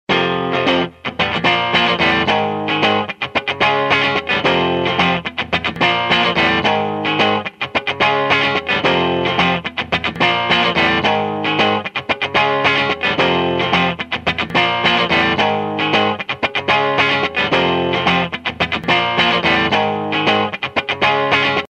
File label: solo